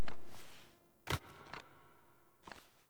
Foley Get Up; Teshin; Warframe; The War Within; Queens Lair; Cinematics

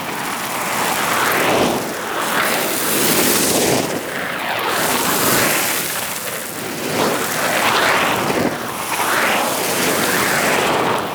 Dragon Luck Fire Loop; Warframe

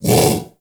Vocal Bark; Warframe; Predasite; Hunting Critters; Characters

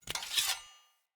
Short Sword Equip; Weapons; Player

